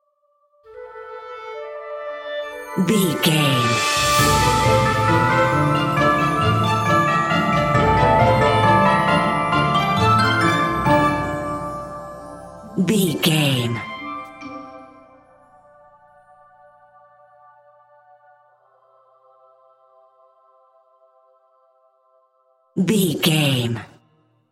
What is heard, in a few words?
music magical story intro